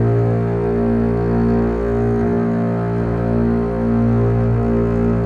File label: Contrabasses A#2; Best Service ProSamples vol.51 - Classic Orchestra 2